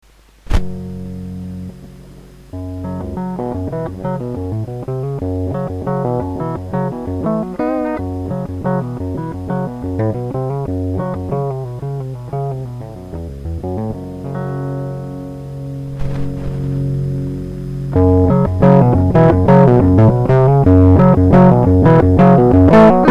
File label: brzzler guit lvl increase, Transistor Variable Bias Heavy Distortion